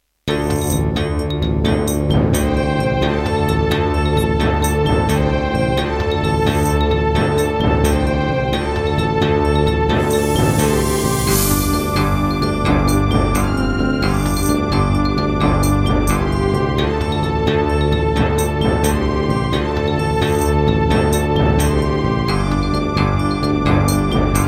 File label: Terratec MiniWaveSystem SOWT-11; Heretic